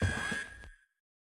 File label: Boss Red Alert; Winkydink